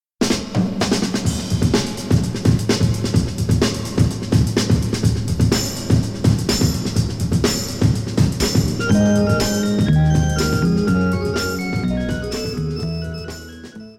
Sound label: Break